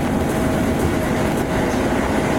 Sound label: 719 mid tunnel; tetsuon